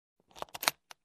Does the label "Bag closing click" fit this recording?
Yes